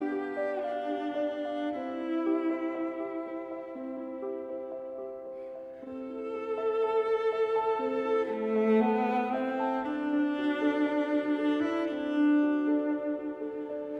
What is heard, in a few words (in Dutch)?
Ave Maria viool, piano